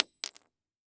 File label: Industry Metal Washer Drop On Cement Single